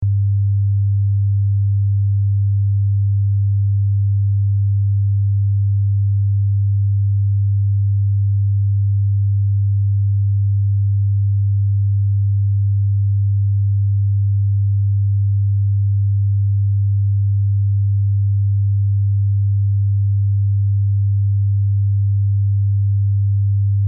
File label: 100 Hz audio frequency